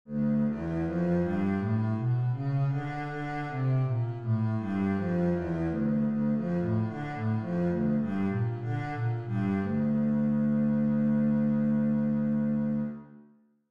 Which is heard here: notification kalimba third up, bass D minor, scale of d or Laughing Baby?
bass D minor